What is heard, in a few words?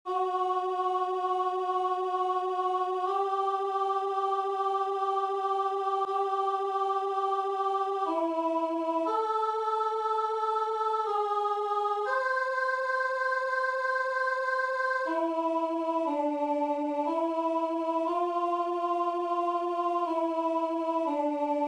Alto; Monteverdi; Vespro; verset 23